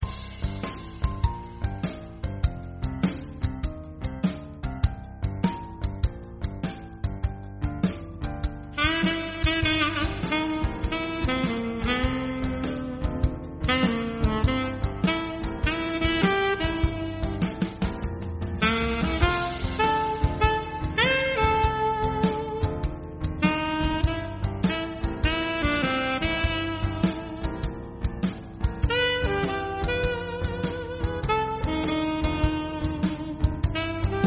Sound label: Playback+ 1 Alto Saxophone